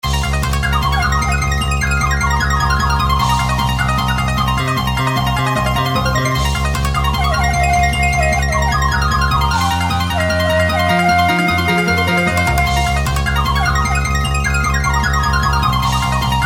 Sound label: Koto, Shamisen; Shakuhachi; ShamiKoto; Hana no Keiji; Syntheway